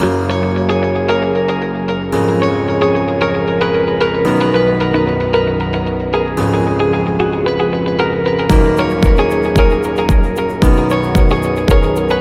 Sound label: Corporate Optimistic; corporate music vol 19